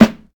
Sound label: short snare drum A key; snare-drum-samples